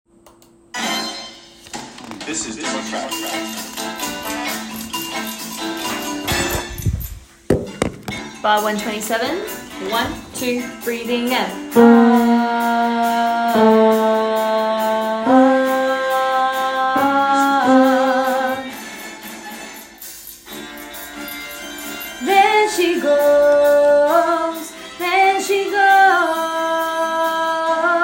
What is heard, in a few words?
Tenor; Fame The Musical - Holiday Pack